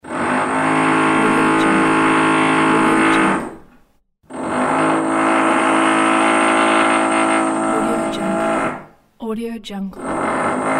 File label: neighbor drilling behind the wall